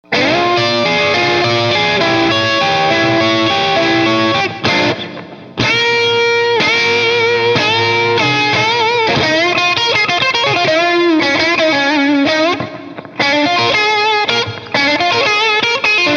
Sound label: Bridge Middle clip